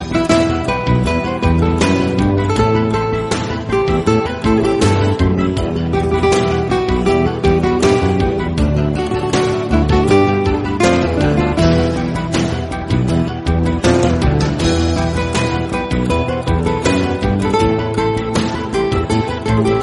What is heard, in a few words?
turkish guitar love ringtone